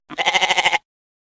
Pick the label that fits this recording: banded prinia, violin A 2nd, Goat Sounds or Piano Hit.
Goat Sounds